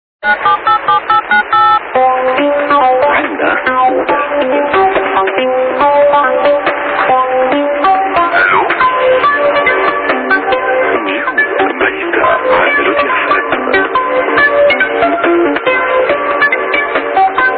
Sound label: interval signal; melodyfm